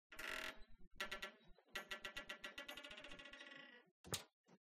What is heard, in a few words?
Entrance Glass door